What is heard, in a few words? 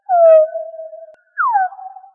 noctule, bats